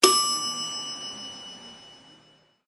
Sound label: elevator chime up; celevator